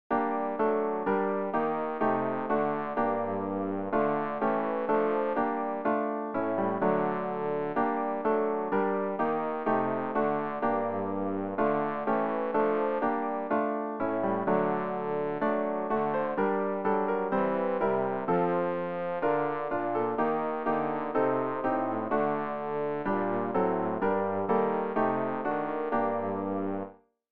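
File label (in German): bass, Übehilfen; Ref. Gesangbuch; RG 165: Liebster Heiland, wir sind hier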